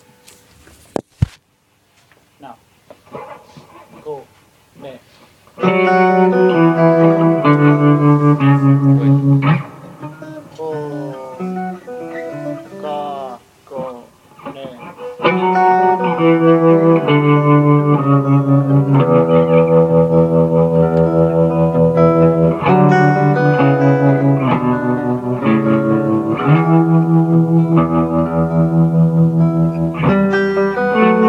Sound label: chorus guit